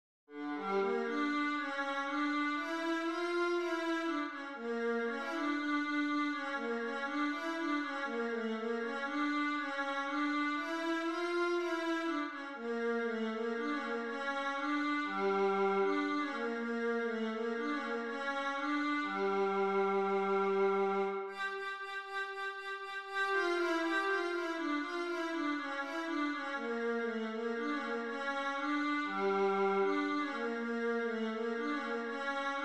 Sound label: bulgarish vla